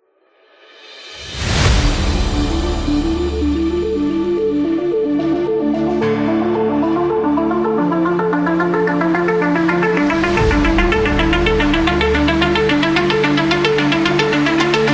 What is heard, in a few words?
Synthpop